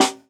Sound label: Snare, jungle breaks drum sounds